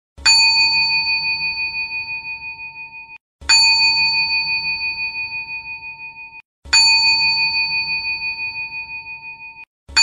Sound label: Ding sound effect